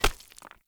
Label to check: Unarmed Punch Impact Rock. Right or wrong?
Right